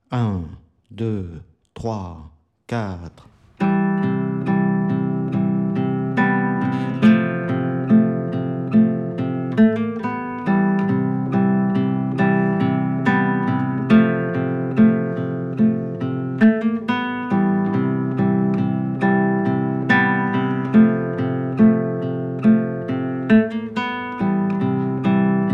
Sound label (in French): exercice 070bpm